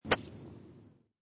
Pitching Wedge Practice, Grass whiff of a pitching wedge practice swing.; Golf; Sports